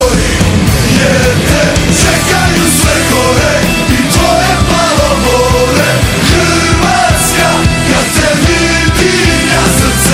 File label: croatian ringtone; hrvatska